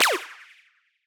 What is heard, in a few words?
Menu SFX Button Pressed